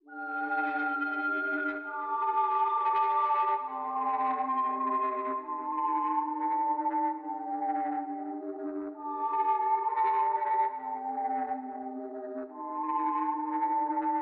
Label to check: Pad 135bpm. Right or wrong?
Right